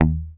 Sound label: HOFNER MUTE, Bass